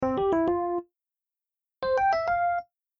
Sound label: LINE Alert Welcome